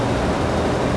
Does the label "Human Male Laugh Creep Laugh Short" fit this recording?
No